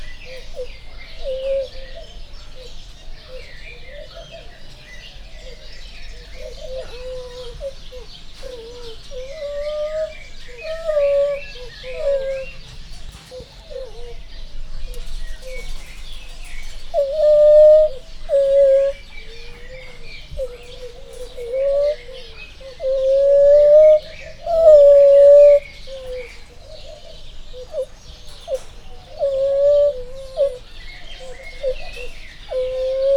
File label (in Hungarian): kommunikációs hangok, fehérkezű gibbon; jaszberenyi zoo 2015